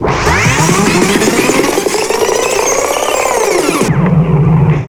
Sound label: backscratch, scratches; Zero-G; Freak Beats